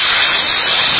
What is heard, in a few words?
jet sound; r2 d2; lego star wars